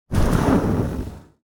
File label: leather seat